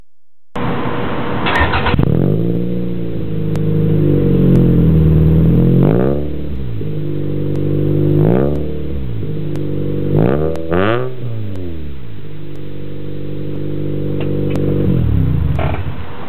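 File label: 3SGE without silencer, muffler, TOYOTA CURREN; 柿本改 FULLMEGA SINGLE(ST202用); サイレンサー無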